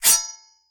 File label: claw ring; smite; god cthulhu